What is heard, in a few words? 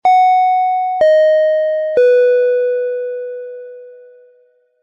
ford door chime